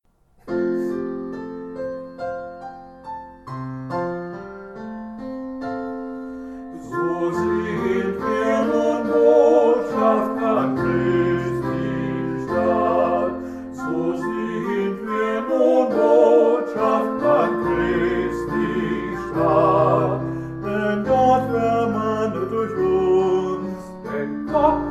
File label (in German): duett duettino